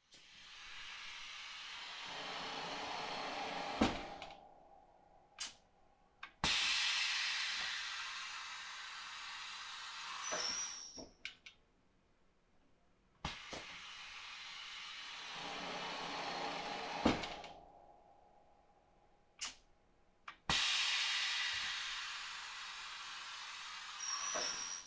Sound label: paraprint automatic parallel lift screenprinting table pneumatic frame raise; kippax